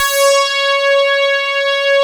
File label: OB FILTER; E-MU Formula 4000 Series Vol. 3; Analog Odyssey